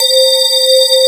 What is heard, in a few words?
METAL BAZZ